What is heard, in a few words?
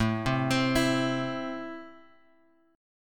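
A Minor, chord strum; Am Chord {5 3 X 5 5 X} arpeggiated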